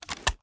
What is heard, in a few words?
sm uzulu reload empty xmag fast start; reloads; iw8; gmod